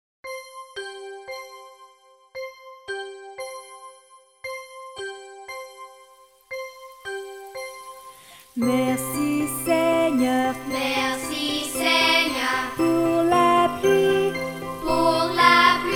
Merci Seigneur (enfants); chants

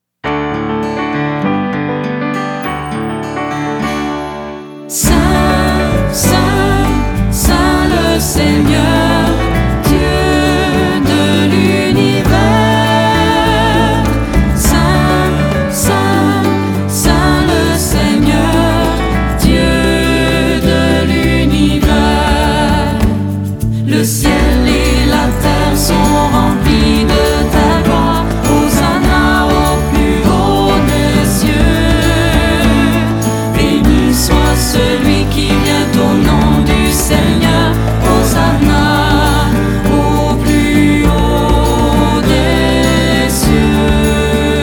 saint le seigneur; celebration eucharistique suggestions de chants

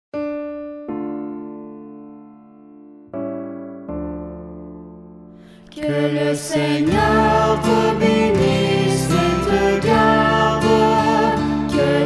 la benediction chant

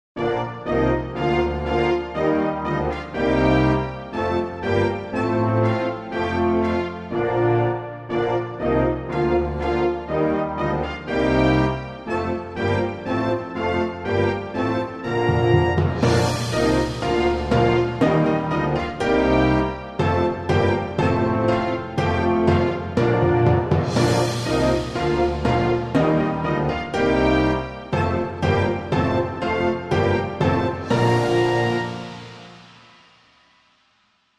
Alleluia (instr); chants

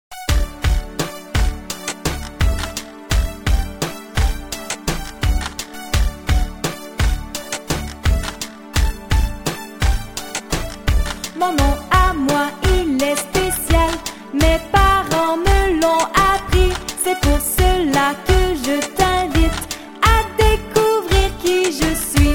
Mon nom a moi (adultes); chants

